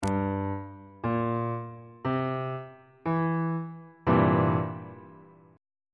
d double flat dominant 7th 2nd inversion on bass clef